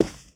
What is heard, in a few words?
carpet, footsteps